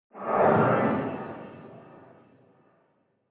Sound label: Whoosh Transition Sci Fi Metallic, Whoosh Transition Metallic Airy Magical; Whoosh Transition Metallic Airy Magical Ethereal Mystical; Whooshes